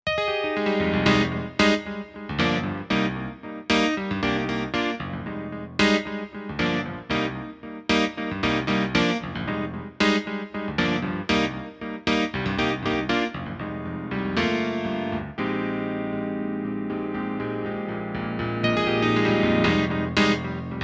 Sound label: Elec Grand; VIVO H10